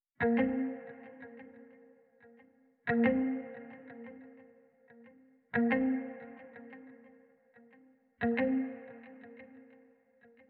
90 BPM G# Maj Guitar; Dreamland

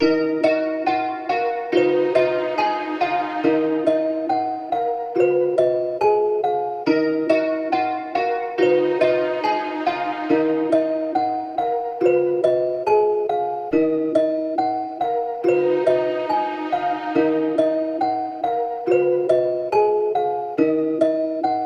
140 BPM D# Min, Dark; Reaper

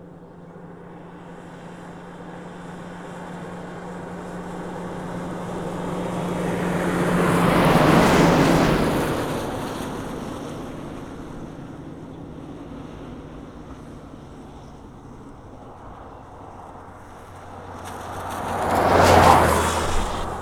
Car Fast By Wet; Vehicles; Cars & Trucks

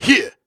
Grunts and Chants, Viking Vocal

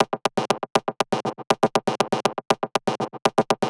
Loop Percussion 120 Tap, Deep House; Loops; Ghosthack; Advent Calendar 2019

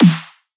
Dubstep Snare; Ghosthack; Dubstep Sound Arsenal

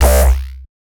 Bass Shot, dubstep and trap; Ghosthack; Dubstep and Trap Pack 7

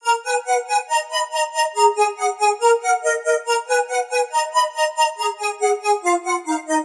Melody D# 140, Melodic Loops, Color Bass; Ghosthack - Color Bass Essentials

